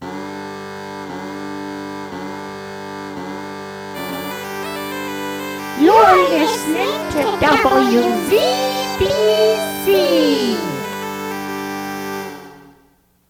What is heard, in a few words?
bagpipes; wzbc